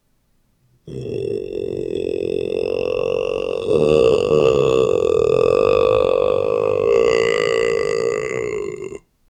stomach growl, gurgle

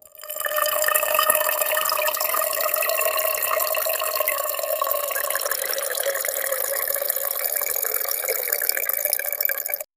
liquid flowing in vein; internal

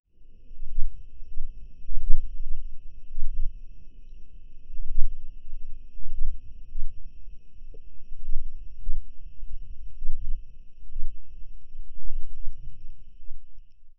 a very intimate and quiet, cat

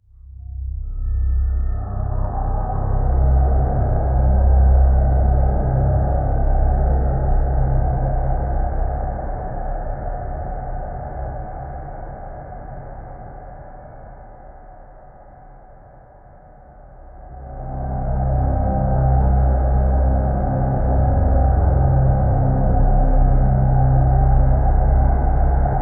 a ambience that fits a, rustling leaves

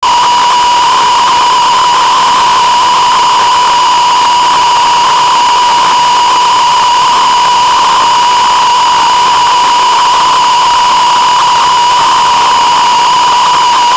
high quality noise interf

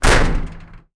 a quick clack clang as the

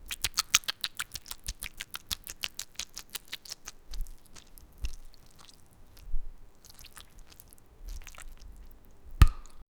squish

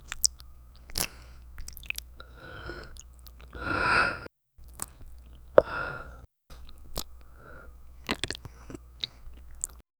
the sound of sucking, mouth

